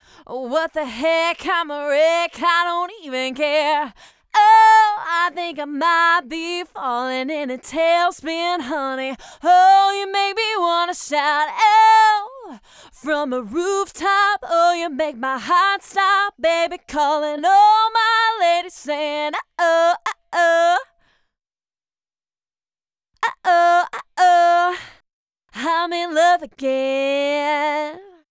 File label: Lead Vox; TubeTech; CL-1B